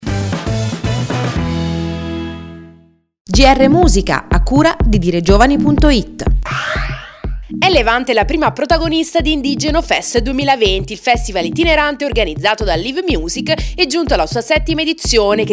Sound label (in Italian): musica